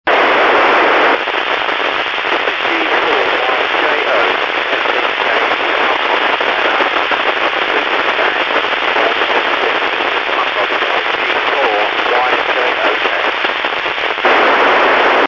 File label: 2m Flowerpot Antenna on 433.575MHz; 4 watts on 70cm over a distance of 27 miles using the unmodified 2m flowerpot antenna